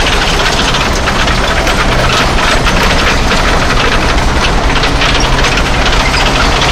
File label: tracks heavy speed, tanks; War Thunder